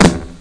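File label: bass drum; OctaMED SoundStudio V1; jazzies samples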